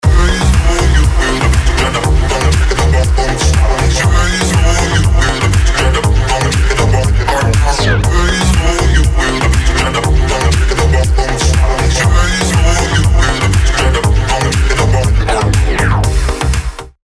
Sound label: Electronic Mastered